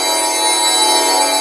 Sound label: G4 BELL, TURBOBELLS; Northstar - The Wizard VOL-1